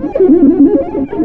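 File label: saxophone, BRASS KIT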